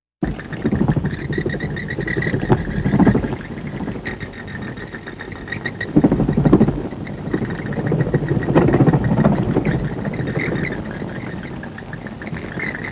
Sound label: Bats